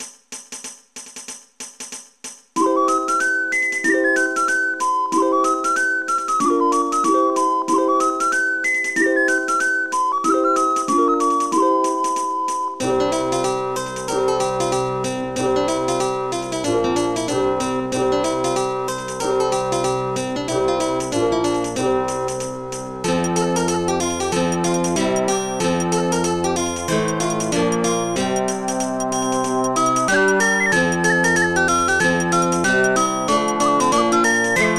Urban Bustle; project exile prototype; gba

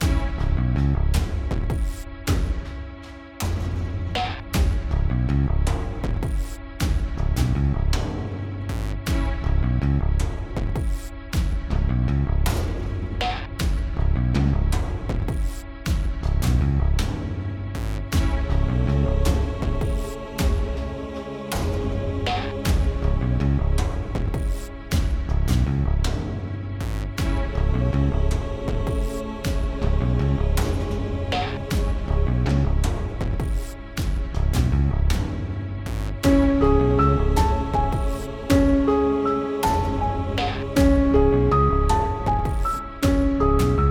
Cemetery Loop

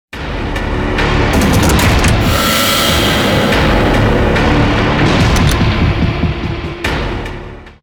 vampire fly in; Vampires bed

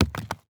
masonry hit; teardown; ps5 windows xbox series xs

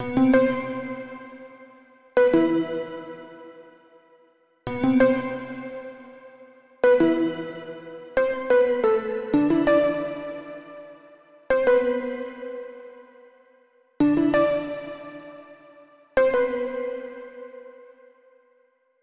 Pluck Melody; super crush k.o.

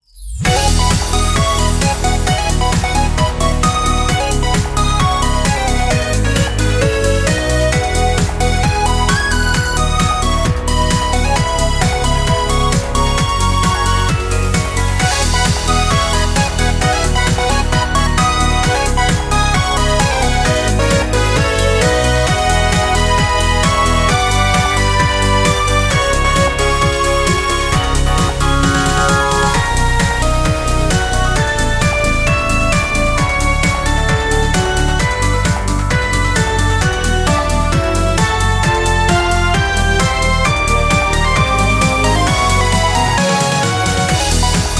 Music Game General event

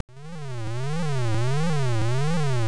Start; Gravitar

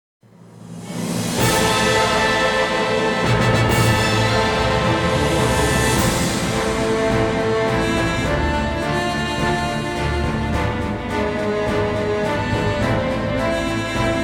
Ominous Prelude; Generation of Chaos

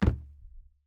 plastic land; teardown ps5 windows xbox series xs gamerip 2022

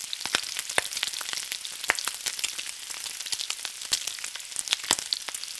Act Kitchen; the princess and the frog; Restaurant; Kitchen Sizzle Lo

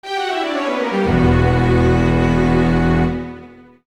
Lose; nobunaga no yabou 2; 3ds

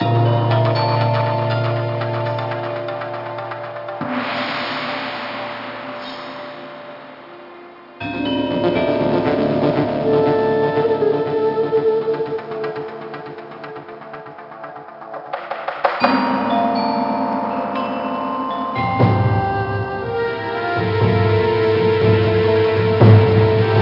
MUSIC LEVEL

